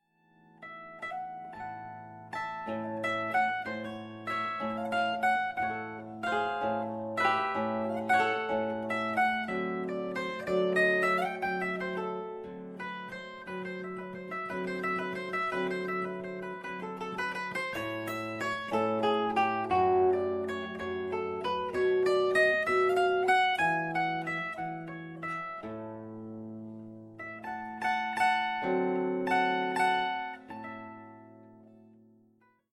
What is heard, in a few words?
blues rag